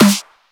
snare punchy

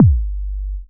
kick synth

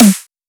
snare dubstep

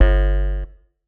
bass g housy

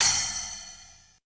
splash electronic

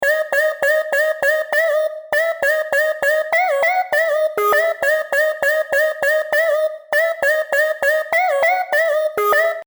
bpm100 d trap synth

